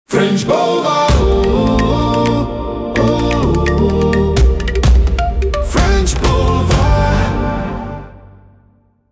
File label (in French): French Jingle Chante; French Boulevard